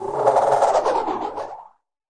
flutter down, Electro and Synthetic

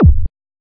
gliss down, Kicks; single hits

